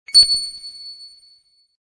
wave notification tone

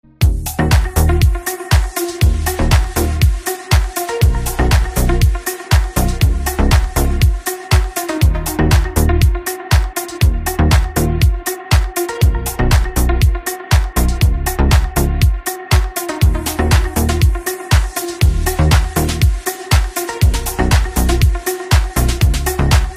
deep house ringtone

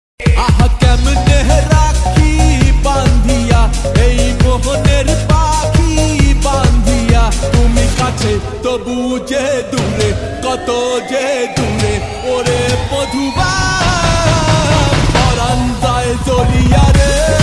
cool bangla emotional ringtone

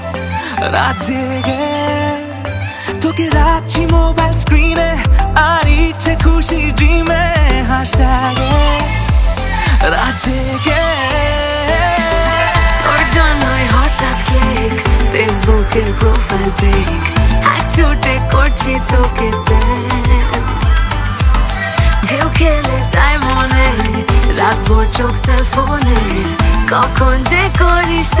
bengali ringtone

